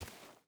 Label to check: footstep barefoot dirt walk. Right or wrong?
Right